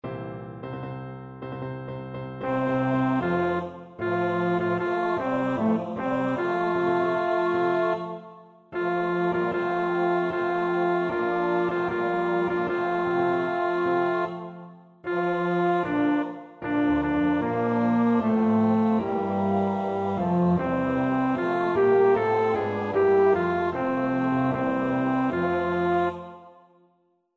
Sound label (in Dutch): bas 2, koorpartij oefening; Wagenaar (Johan); De Schipbreuk